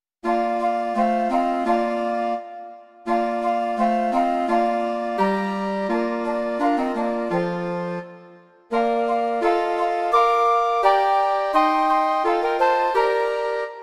2 flétny a 2 klarinety; Malé kapky vody; Imaginativní verze

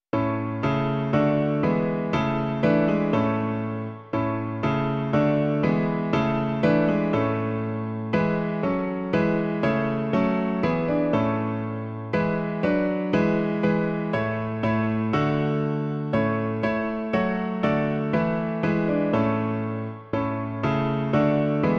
klavír; Klavírní verze

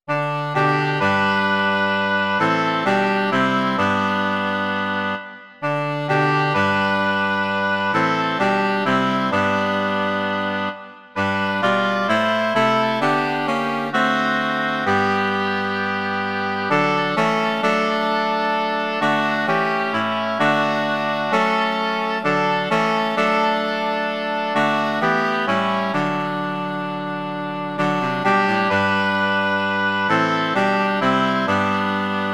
2 hoboje a 2 saxofony; Až pomine tento svět; Imaginativní verze

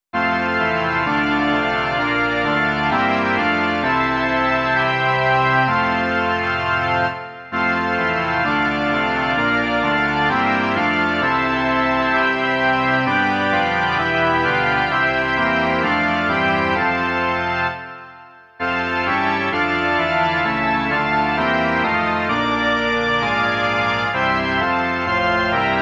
varhany

